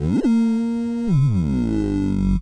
voxy filter bass descends; Basses

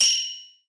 Yamaha TX-81Z OH; drum machines

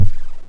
Syn Dr, Overloaded Synthetic Drums; style kits

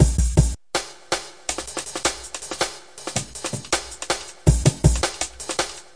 dinky break, drum loops and breaks, 161 bpm; 161 to 180 bpm

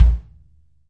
kick, Multisampled Real Kit; REAL LIVE KITS

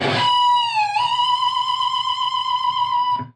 pig squealing guitar

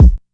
classic hh kick; Hip Hop; STYLE KITS; Classic Hip Hop Mega Kit

